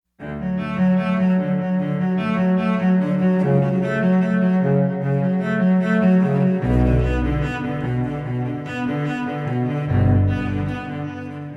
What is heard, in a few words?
Instrumental